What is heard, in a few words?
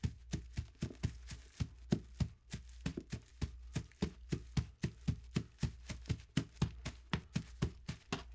Horse Galloping; Fairlight Sound Lib